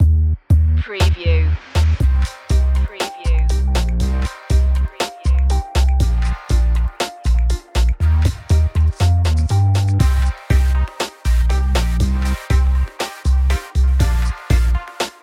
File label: bittersweet electro hip hop